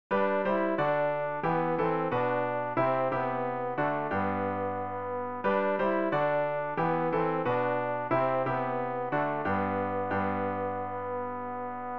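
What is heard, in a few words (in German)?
tenor, Übehilfen; Ref. Gesangbuch; RG 194: Kyrie, kyrie eleison (Taizé)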